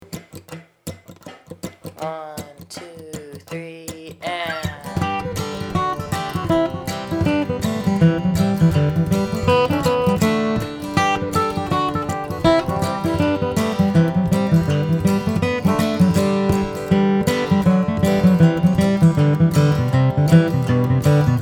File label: flatpick guitar; blackberry blossom; flatpick guitar magazine